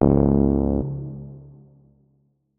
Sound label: notes, keyboard, electric piano